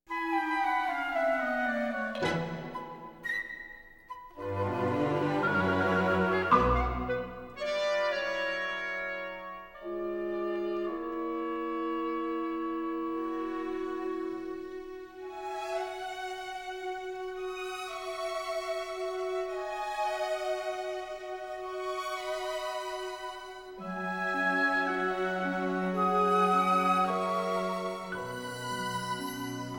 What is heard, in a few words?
instrumental